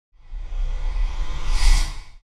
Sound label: Swipe